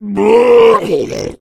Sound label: male boomer spot prey, voice, attack; Left 4 Dead 2